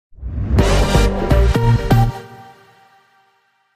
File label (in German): Soundlogo Elektro